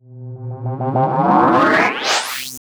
HARD RINGZ; Spectrasonic Distorted Reality 1

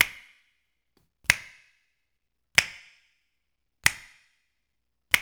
HMM, 93; Spectrasonics - Supreme Beats - World Dance

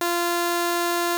Prophet 5 F5, Synthesizer; Hollywood Edge - Giorgio Moroder Rare Synthesizer Collection

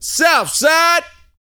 VOCAL F; ILIO

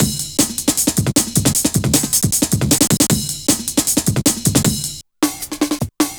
drum loops, jungle; Jungle Warfare 1&2

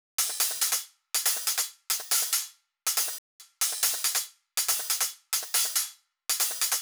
drum Loop 140BPM HH

